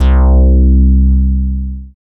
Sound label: BASS STER.